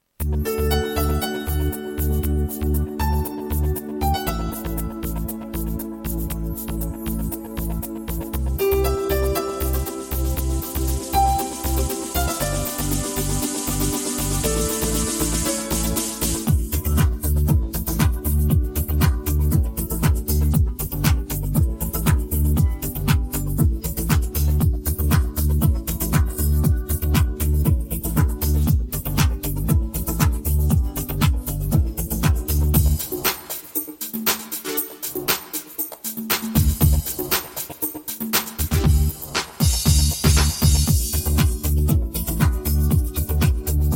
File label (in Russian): detskii ansambl vo pole oreshina minus